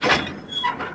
car connect; vcmod

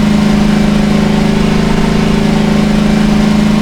jeep low

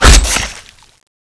stab hit; tfa cso; budget slayer

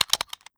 foley shell futz; weapons

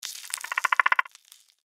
mirelurk idle; npc

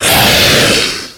attack

